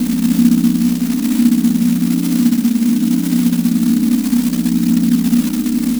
Drone Ambient; Drums & Drones

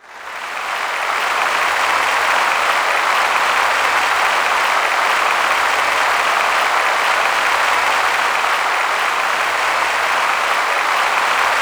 C3, SynthLine; 90 s Sample CDs; NiceBeats; SynthLine VOL-10

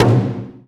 SMALL DRUM, JAPANESE

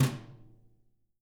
BRUSHES, Living Drums

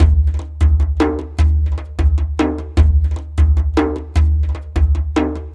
Dumbek Fast, Ethnic Percussion; Sonic Foundry (Sony Creative Software); Ambient Atmospheres & Rhythms

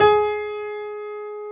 PF G#3 L, B GRAND PF

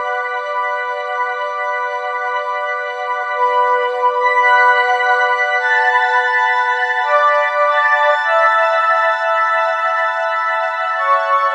Keyboard; Sonic Foundry; Rondo Brothers Trip-Hop Loops & Samples

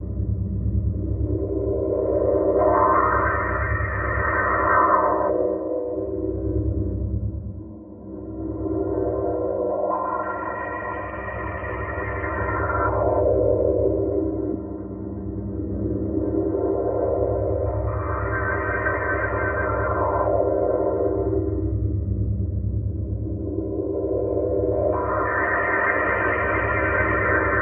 resonant swirl, Textures

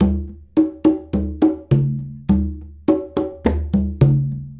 Congas; Essential Percussion

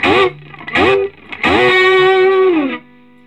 SOLO, Vintage Blues Guitar

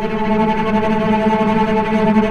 VCS TREMOLO, string; East West - The Ultimate String Collection

